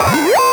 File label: 8Bit Fx; Antidote Advent